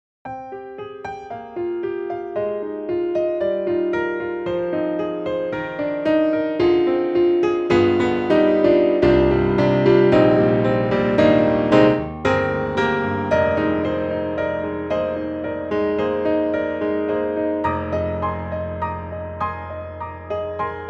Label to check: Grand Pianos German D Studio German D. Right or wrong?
Right